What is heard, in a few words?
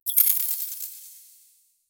gold collecting sound, collectibles